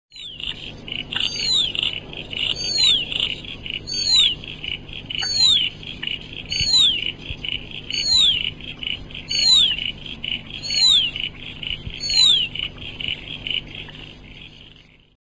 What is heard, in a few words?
horsfields bronze cuckoo